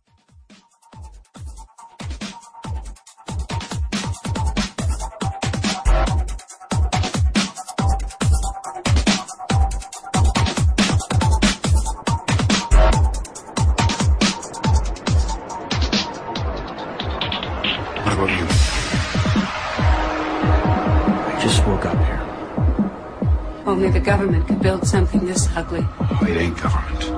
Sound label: dubstep